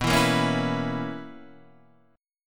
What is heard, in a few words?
B Major 7th Suspended 2nd Suspended 4th B down Guitar Open D